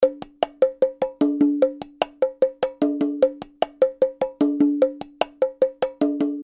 Perc

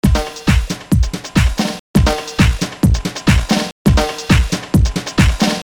Drum, Mullard Long Plate

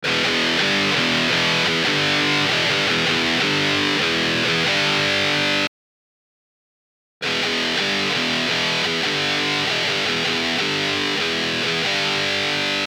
Comb Filter

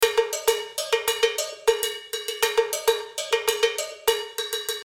Perc Real Tile

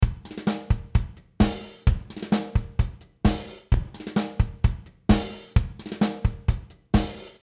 Drum Real Tile

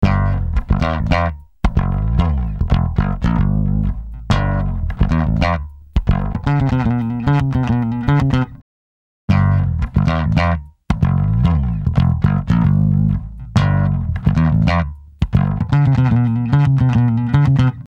Bass, 150Hz; Passive Tube Retro Lo Cut Retro Console 76 Comp

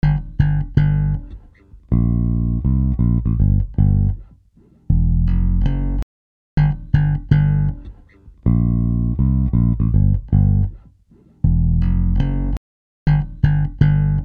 Bass, Mullard Short Plate

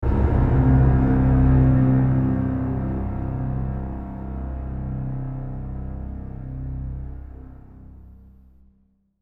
String True Hall

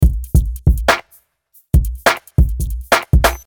Drum

